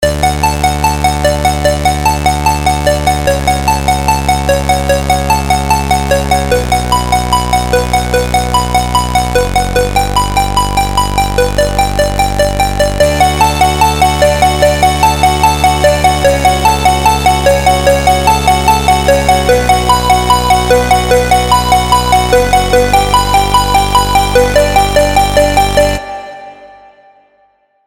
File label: Electro melody